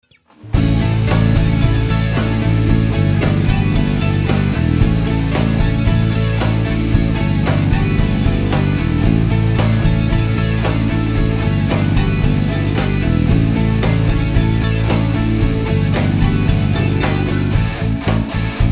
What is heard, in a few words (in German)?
Song mit Drawmer Comp Summe